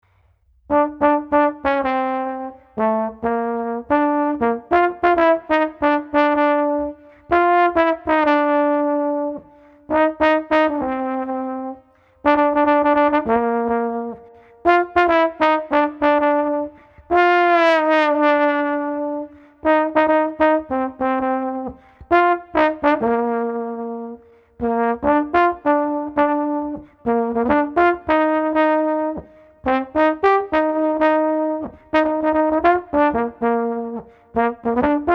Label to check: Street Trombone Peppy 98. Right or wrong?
Right